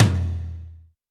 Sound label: high floor tome drum sample f key; tom drum samples